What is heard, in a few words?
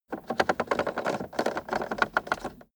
car temperature knob turn long fast